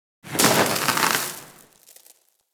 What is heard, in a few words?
Spark Shower; Fortuna; Warframe; Venus Open World; Venus Solaris United